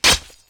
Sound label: drop spear